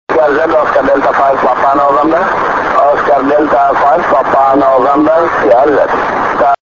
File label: 6m ssb